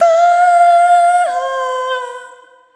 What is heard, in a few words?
Arrhythmic F